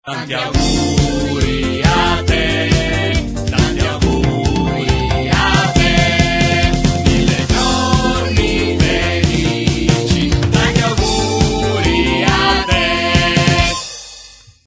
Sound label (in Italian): auguri disco